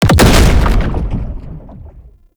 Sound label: Rupture Cast Impact; Roathe; Warframe; Tau; Abilities